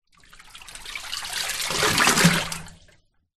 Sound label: household towel wet lift from water washing machine drop back in